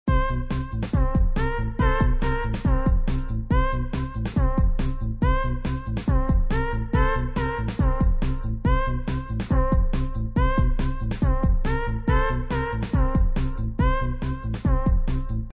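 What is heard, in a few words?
BGM Spotted; Shop Empire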